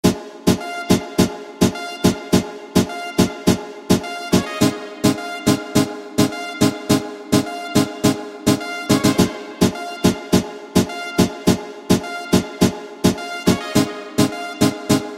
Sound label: INSTRUMENTAL